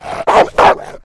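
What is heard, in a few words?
howler monkey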